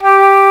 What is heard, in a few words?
FLUTE G2, SYNTHESIZER LEAD